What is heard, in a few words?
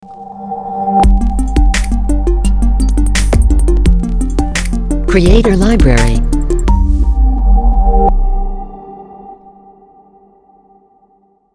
Digital Shapes Logo; Logo-Idents